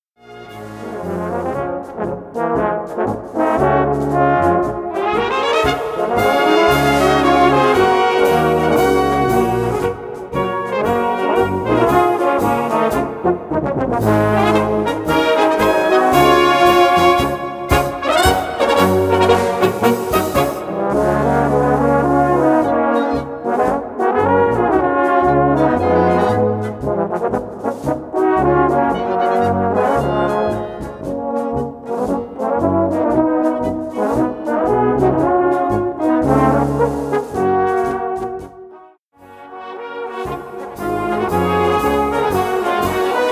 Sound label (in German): Marsch der Goldenen Blasmusik